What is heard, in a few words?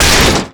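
crowbar hit body robot; melee impacts; Garry's Mod; tf2; mvm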